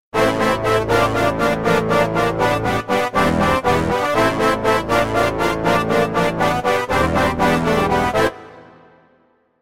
Stab Octave Section

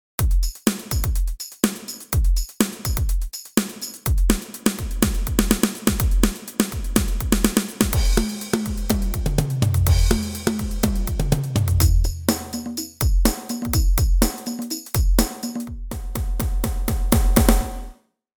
Pop; live instruments; Premium Pop 2

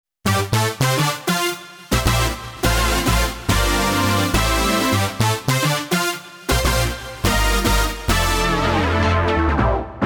Pop Brass and Syn Brass; montage; brass synth

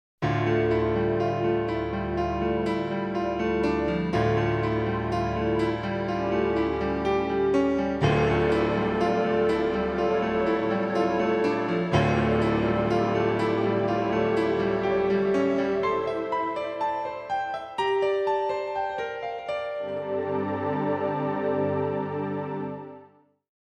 CFX Padded; Montage

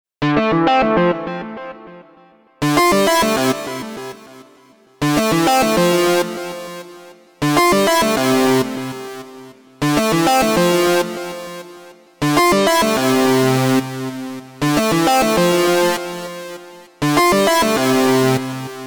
JP Sync Stereo Clean

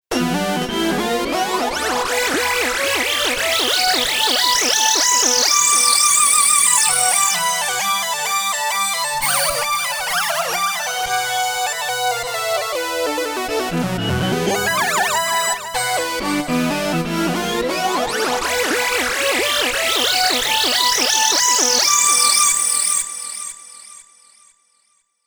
Hybrid Sync; Montage

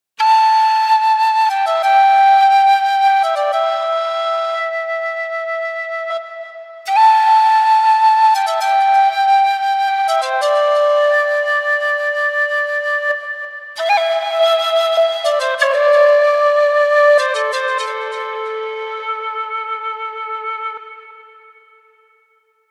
Low D Whistle, flutes; montage

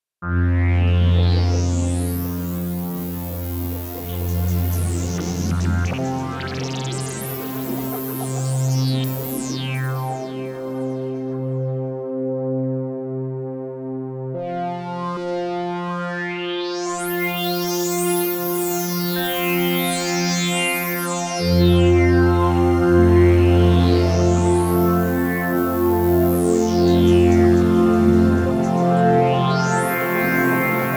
Sweep and atmo; phat analog

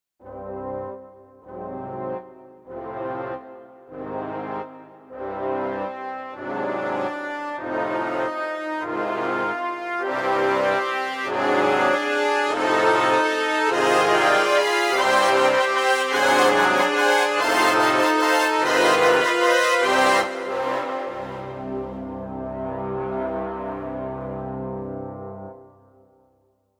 Orch Brass Swell; Montage

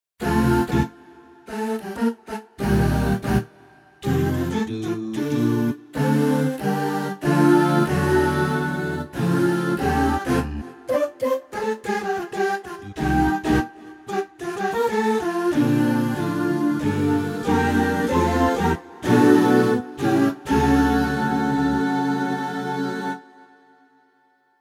Latin Vocal, choirs; montage

